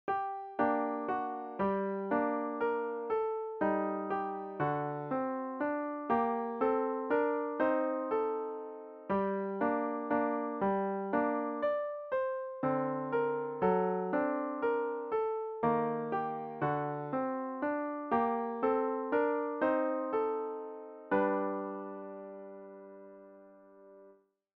piano

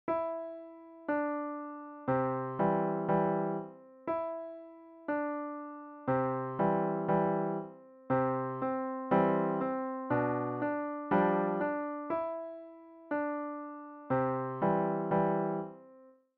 piano solo

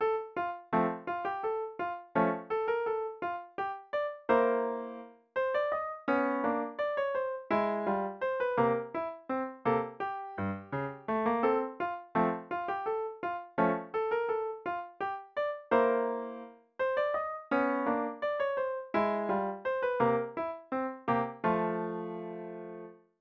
carousel piano

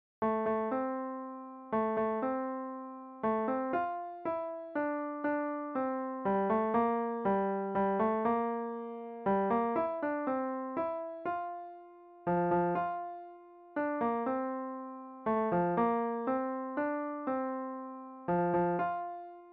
piano

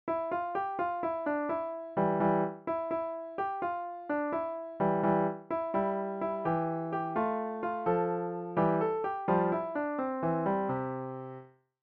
piano solo; hickory dickory dock

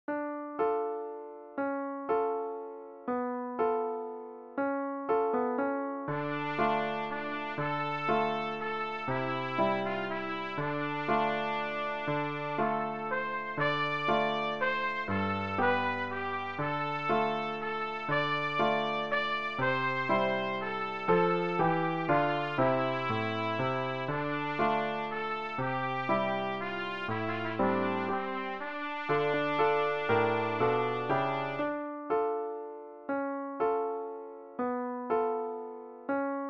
scarborough fair trumpet solo

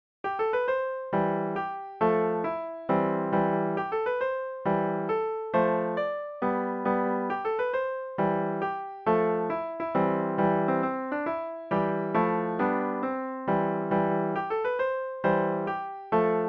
junior birdman piano

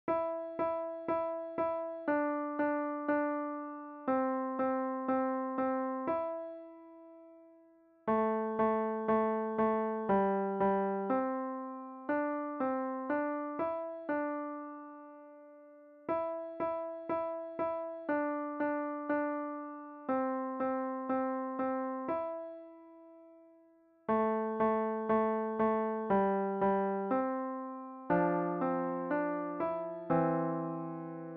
piano solo

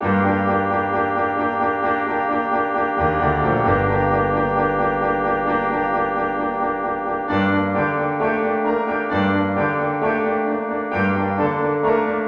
he is born the divine christ child piano duet

